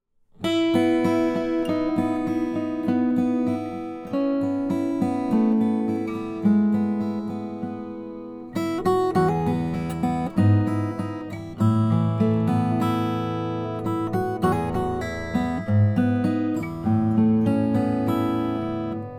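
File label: lowden honduran rosewood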